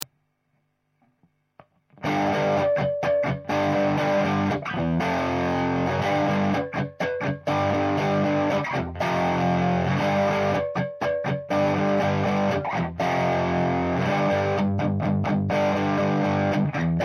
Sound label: tele dist; orange tubefactor; tele pu test; staufer